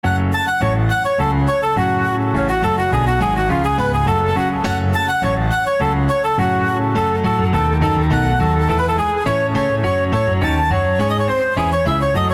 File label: Badinerie flute piano; Bach